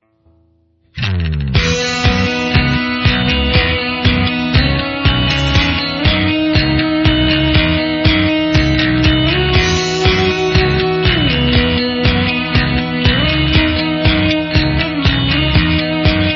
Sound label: Ebow